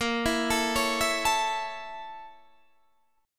A sharp Minor Major 7th Flat 5th, down, Guitar, Standard; arpeggiated; JGuitar